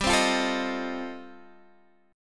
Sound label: F 7th G, F7/G chord; F7/G strummed